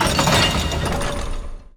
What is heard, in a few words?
Enemy Gear Common Appear; Disney Star Smash; Android, iOS, Mobile